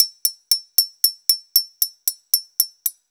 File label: 6-8 Bottle, Perc Loops